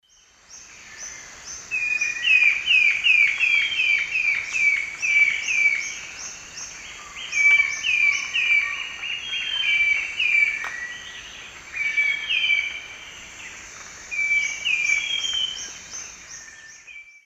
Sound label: Hyloctistes subulatus, song; S. America; Eastern Lowlands